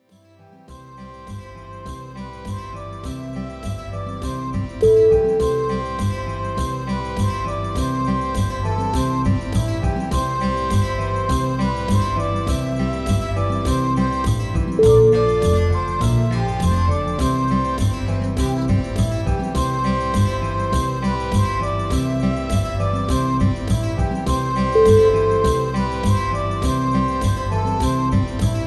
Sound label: melody for happiness